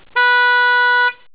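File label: alpha2 compact high, car horn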